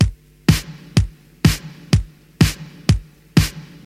125 bpm drum groove g key, Free breakbeat - kick tuned to the G note; drum loop